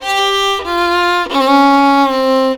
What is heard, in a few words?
VIOLON B, Indian Traditions; USB Soundscan vol.45; AKAI